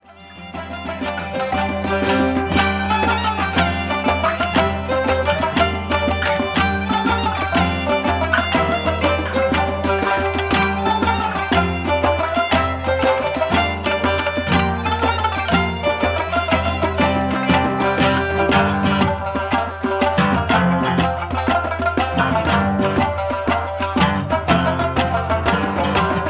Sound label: resonance sample